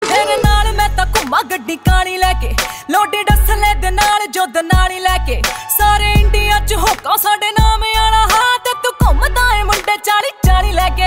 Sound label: Ringtone